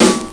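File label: snare break, Yamaha Motif ES drum machine; 300 Drum Machines; Yamaha Motif ES-Series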